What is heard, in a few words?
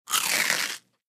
Dry Cereal Dry Crunch